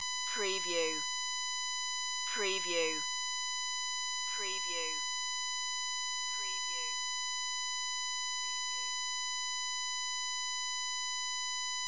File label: Hospital Life Support; sound-effects-bible